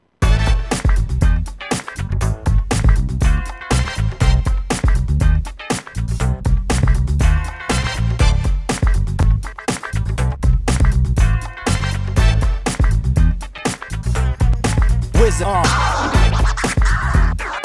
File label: Scratch track